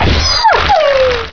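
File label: growl die; TNP demo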